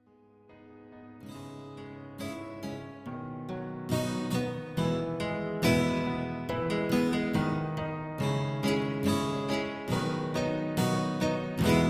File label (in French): Des milliards de voix instrumental